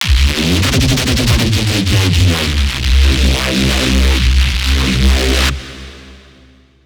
reese thing, bass

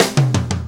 4-4 Fill, Drum Loops

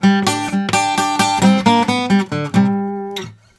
Blues Guitar; ILIO; Fretworks; LICK

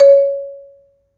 m C#3, Marimba; Misc; Terkelsen

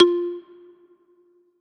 marimba F; Polyend; ARC Noise

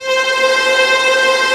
Viola C4; Digital Sound Factory Virtuoso

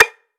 Rim 808; 808Flex; VANAGON

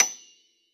Honky Tonk Piano ff F6; Best Service ProSamples vol.53 - Classical Prepared Pianos; Total Piano

